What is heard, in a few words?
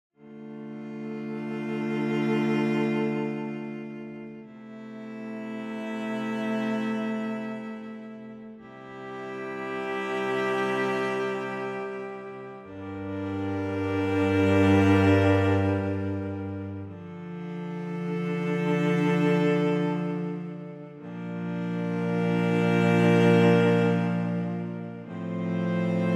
cello; intimate cello; bunker samples